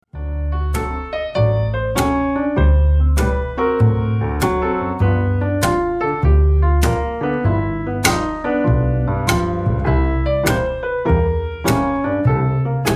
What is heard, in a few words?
Lazy River